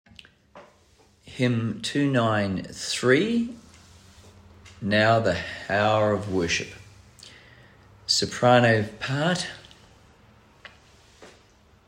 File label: Soprano; Now The Hour of Worship is O'er; Hymns for Jubilation; choir parts